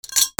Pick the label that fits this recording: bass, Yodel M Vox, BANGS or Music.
BANGS